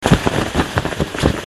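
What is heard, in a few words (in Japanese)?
nuno, cloth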